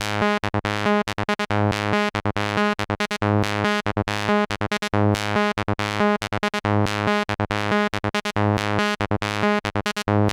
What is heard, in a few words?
Sol-7 G#; Vengeance Samples